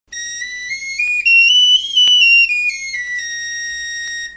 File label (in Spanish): flautilla